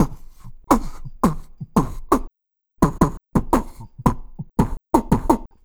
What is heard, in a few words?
Beat Box; Beat Components